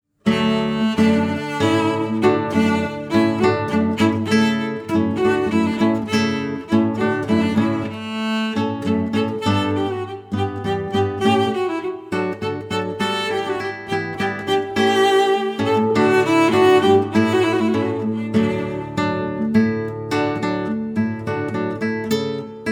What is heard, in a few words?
Hornpipe Vc-Gt